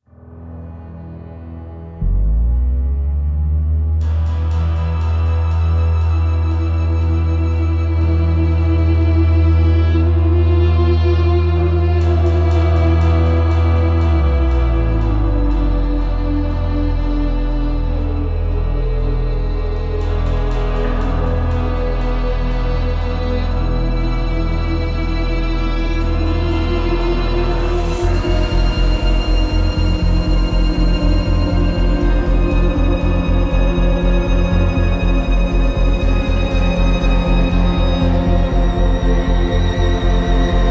Danger Ambient

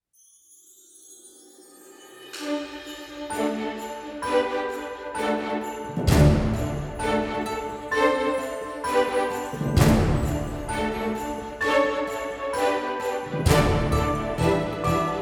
No Choir

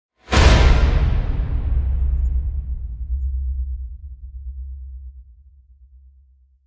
Fright Whoosh; Gothic Storm Music

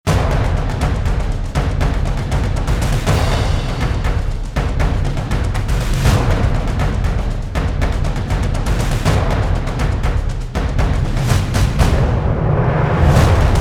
Drums Only Version

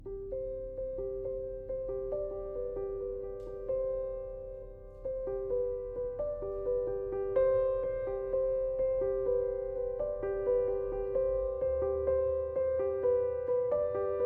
Solo Piano Music

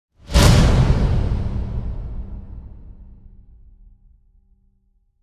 Assassin Whoosh; Gothic Storm Music

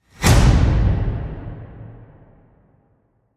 Sabotage Strike; Gothic Storm Music

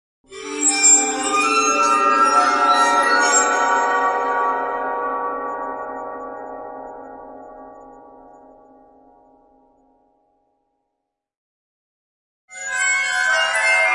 Waterphones; Epic Tools vol. 1

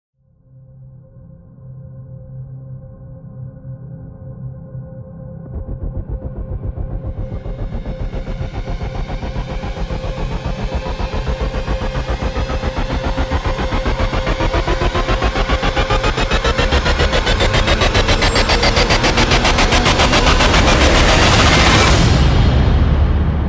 Rise; Ninja Tracks

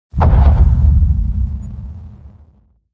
Mega Foot Crunch Hit Deep; Ninja Tracks

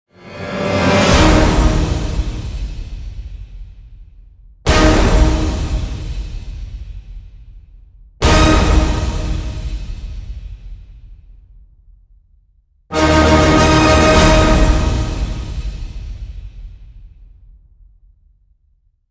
Orchestral End Hits (D#); Epic Tools vol. 1

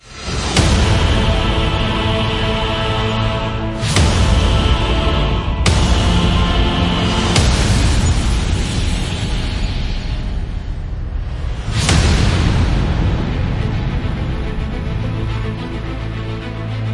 Electro Hybrid; Gothic Storm

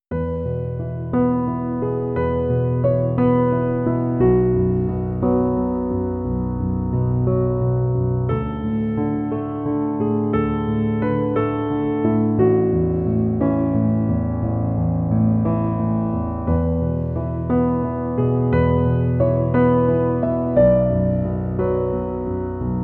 Thinking Music, Piano Study Music

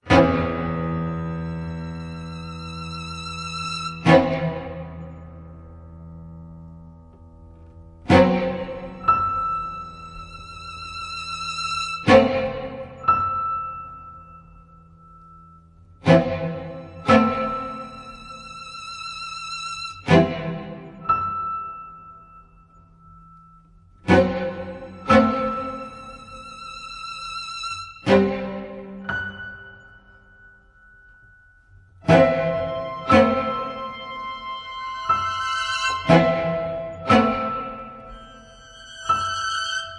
Alarm Intimate

